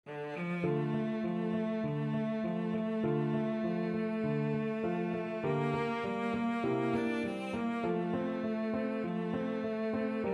cello

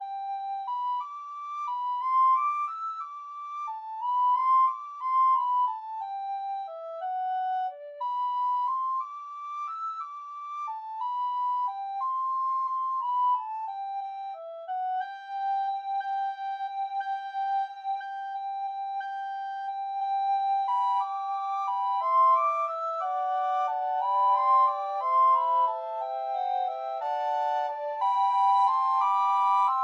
quem recorder trio

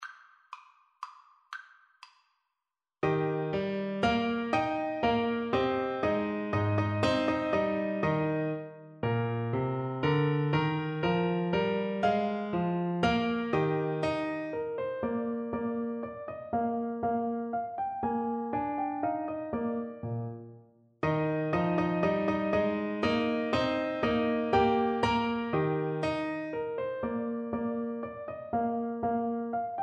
German Dance, Saxophone

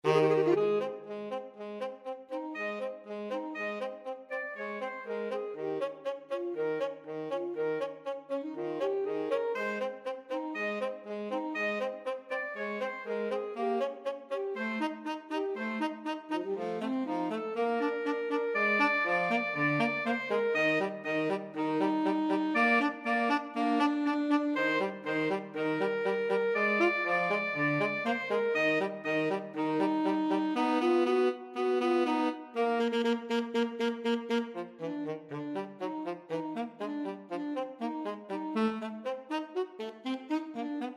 2 sax; alto-tenor sax duet

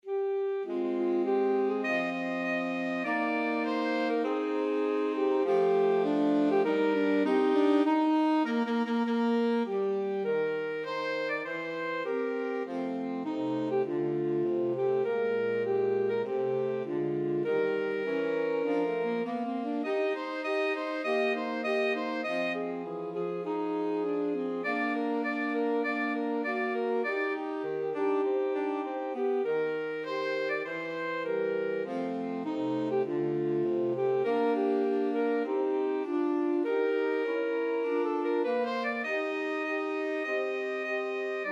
alto sax tenor sax

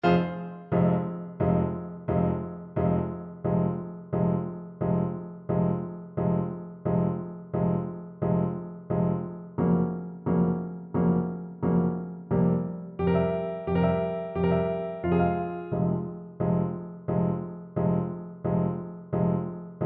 horn, French Horn

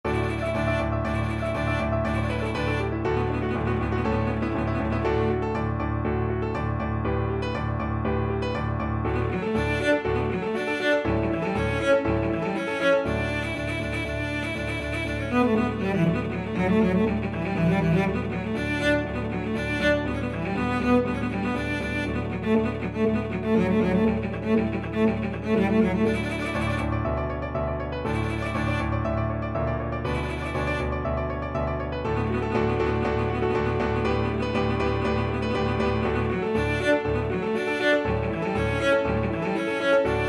Capriccio Espagnol, cello

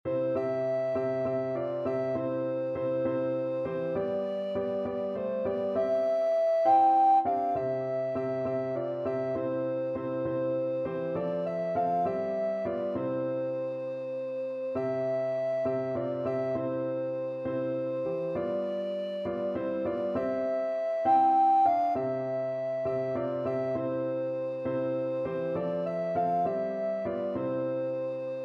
alto recorder

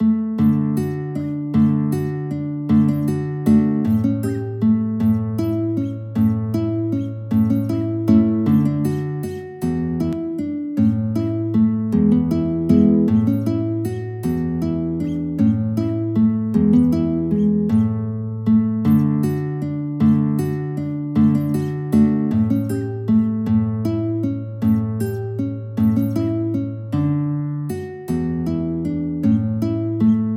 Waltz In D guitar; Carcassi

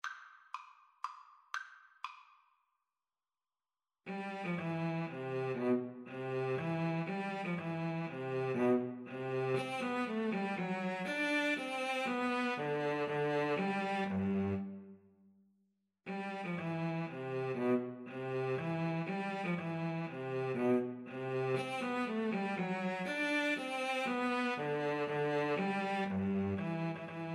trumpet cello, Trumpet-Cello Duet

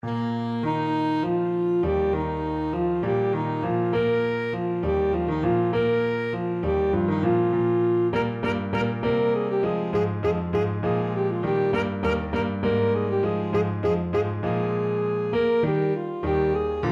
handel water music, saxophone

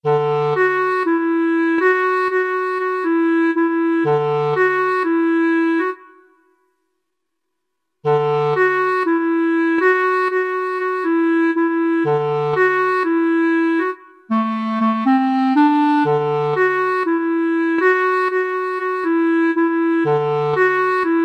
black pepper samba, clarinet

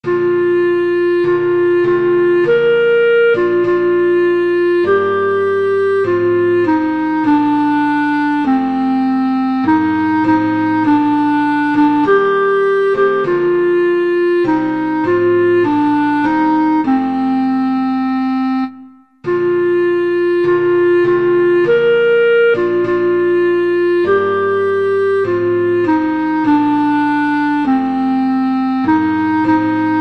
lord of hosts, clarinet

